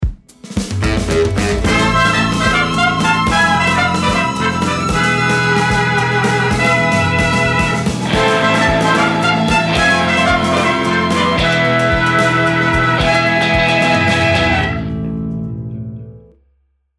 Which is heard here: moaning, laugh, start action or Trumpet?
start action